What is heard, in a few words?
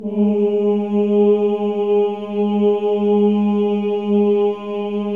OHS, Symphony of Voices; Spectrasonics